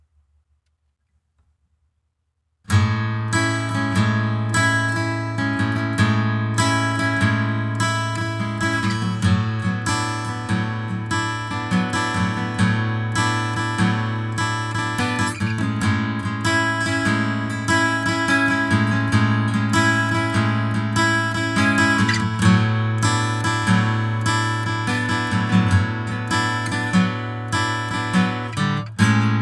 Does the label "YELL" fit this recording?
No